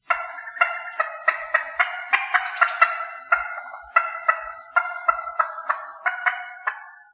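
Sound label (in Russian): индейка в лесу звонкий близкий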